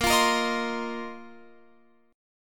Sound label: Bb Suspended 2nd Suspended 4th Bb down Guitar Open F; Bbsus2sus4 strummed